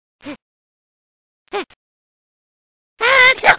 Child Sneeze

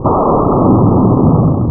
rocket

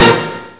Blast

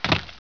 gravel land; Player; Heretic II Demo

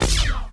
Rotary Laser; Warzone

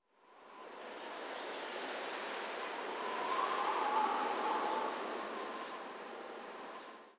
wind; outlaws

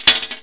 brass metal; SpecOps2 MPlayer Demo

